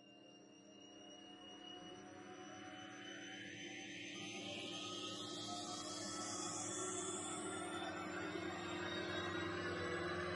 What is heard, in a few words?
Risers Hyperbolic; Gothic Storm Music; Advanced Sound Design 2012